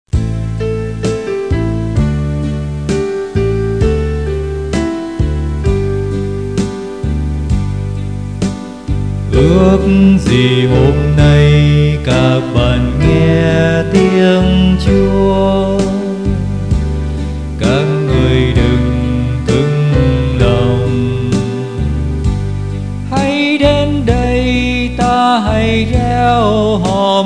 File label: BASS